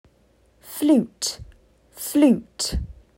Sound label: Flute